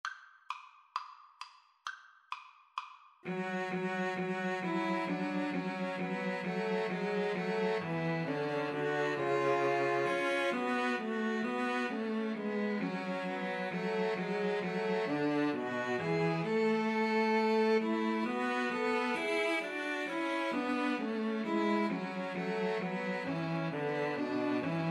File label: god rest, 2 Violins Cello